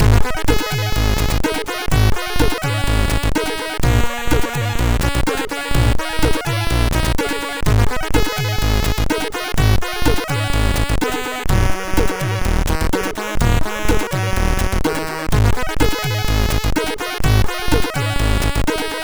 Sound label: Rolling Melody, sid MOS6581R3